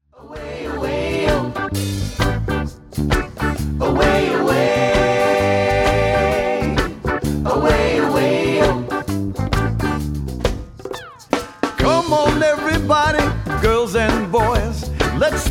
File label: jamaican dance sample